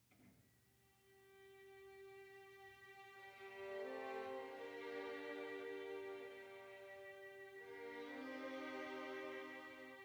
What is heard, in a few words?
Piano